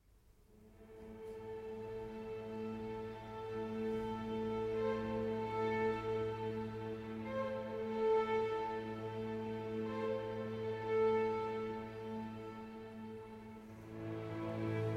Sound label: Violin and String Orchestra; Et Sæterbesøg (A Mountain Vision)